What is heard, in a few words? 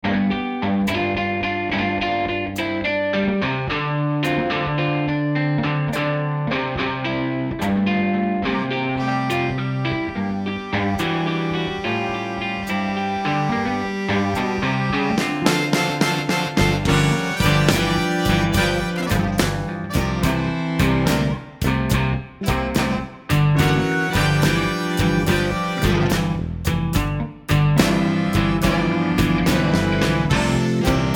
Blues Rock